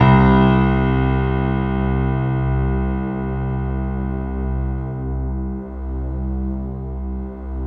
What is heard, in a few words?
piano, Piano+String